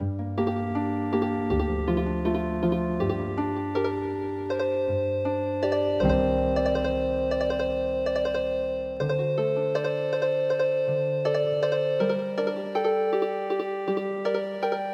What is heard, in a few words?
festival song Healing Harp